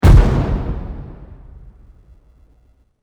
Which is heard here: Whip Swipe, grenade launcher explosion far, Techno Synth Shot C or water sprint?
grenade launcher explosion far